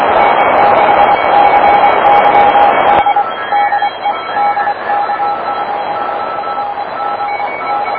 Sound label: noise cw